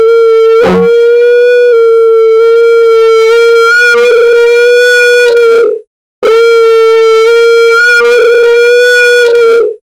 emergency alert system, alarm

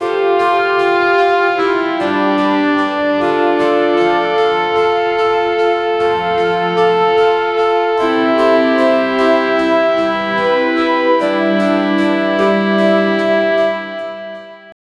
medieval music for mmorpg, adventure

